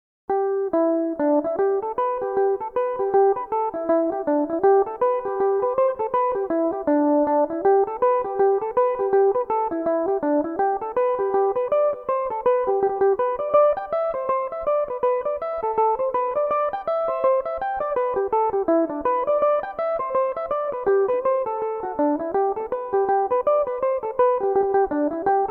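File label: G major, irish mandolin, tunes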